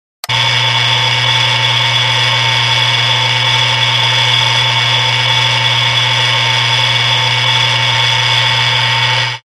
Alarm Clock; Digital; Long Throaty Alarm Clock Buzz. Medium Close Perspective.